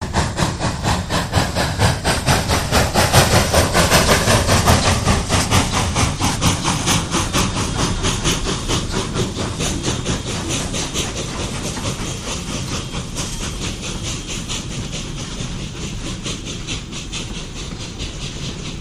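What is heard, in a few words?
Steam Loco Close Up By, steam train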